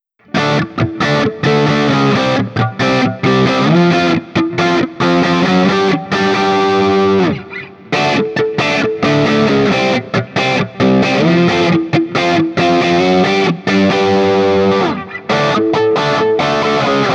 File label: Guild Burnside Crossbow BN JCM800 A, guitar; Burnside (by Guild) Crossbow; 7th Chords; JCM-800 – BK; A-Shape